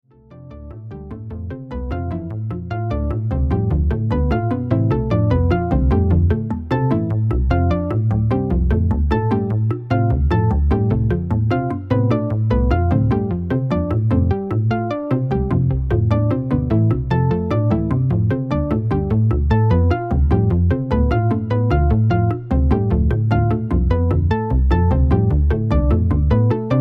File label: Tin Roof, electronic music